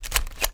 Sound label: PUNCHER, STATIONERY; AKAI S-Series CD-ROM Sound Library VOL-6